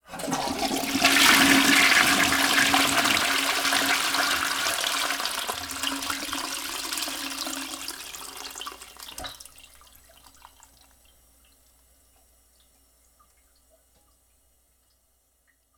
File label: Flush; The Salesman